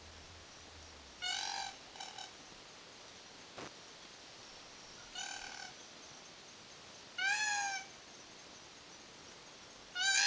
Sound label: Female koala screeching